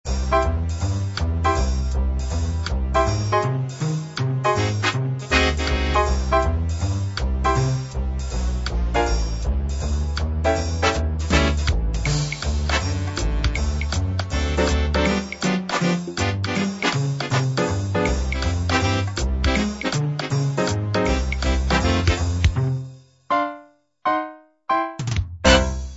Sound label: big band